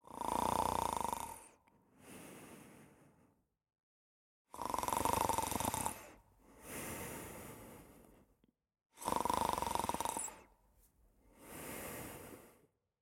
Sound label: snorring female